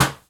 Cave, Impulse Response; Lawrence Welk; EchoThief Impulse Response Library

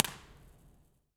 Underpass Valencia; EchoThief Impulse Response Library

